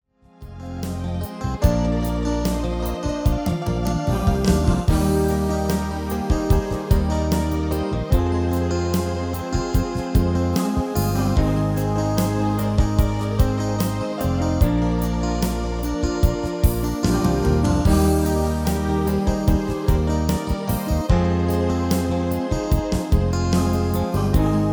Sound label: MIDI